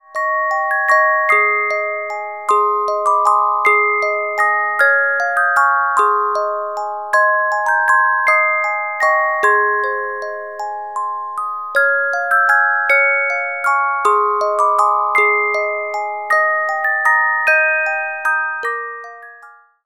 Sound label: Carillon Music Box Melodies